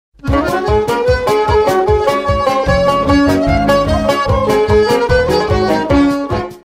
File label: polka; IV figura; MAYO LANCERS SET